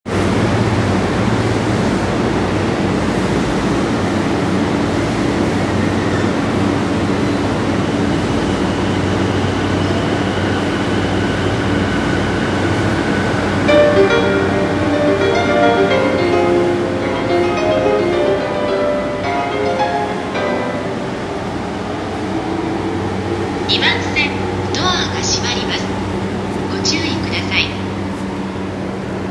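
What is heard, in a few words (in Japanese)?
山手線上野 counterclockwise, train sounds; JR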